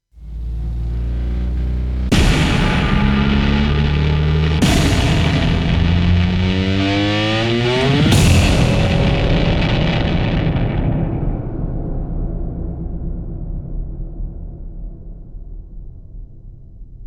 I Eat Bass; Gothic Storm Music; Brain Warp (Sound Design Trailer Tools)

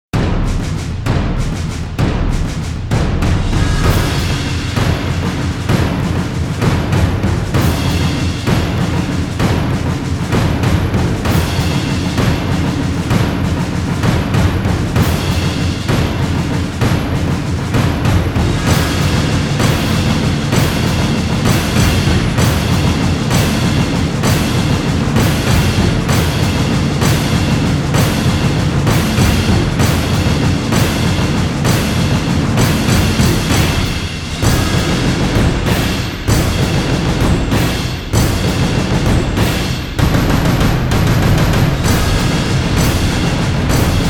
Drums Only Version

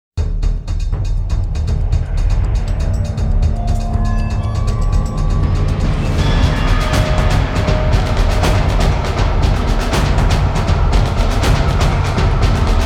Big Ass Drums

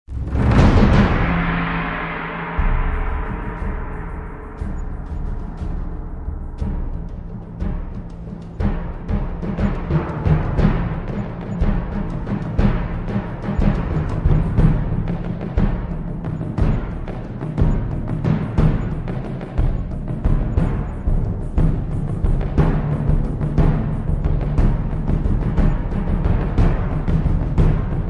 Drums Only Version

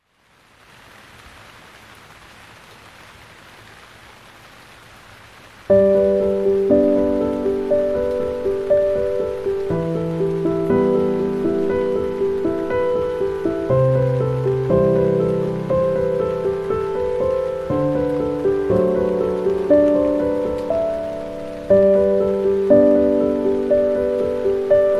Piano, Piano & Rain

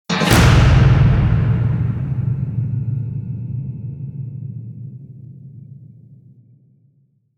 Warped Machine, Sound Design Trailer Tools; Gothic Storm Music; Brain Warp (Sound Design Trailer Tools)

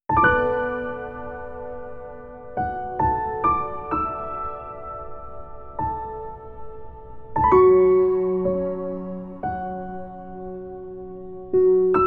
Calming, Piano for Sleeping Babies

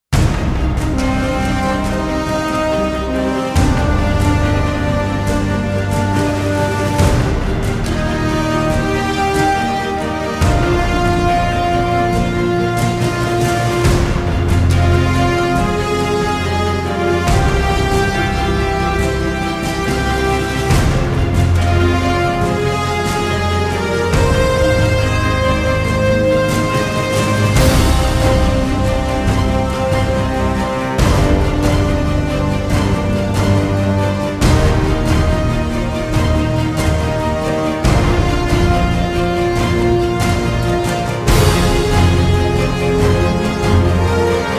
Alt Percussion

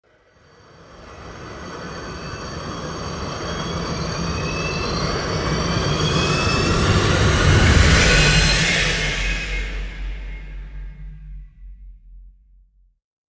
Sweeperman; Gothic Storm Music; Brain Warp (Sound Design Trailer Tools) 2011

